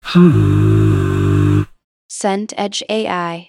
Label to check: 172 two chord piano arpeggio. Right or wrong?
Wrong